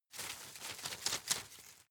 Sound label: shake medium, foerster; berufe